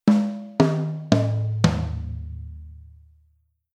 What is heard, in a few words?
Rhythm Jazz Stick Tom